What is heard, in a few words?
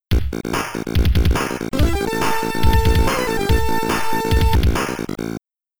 Game Over; Thunder Blade; Amiga; Primary Attack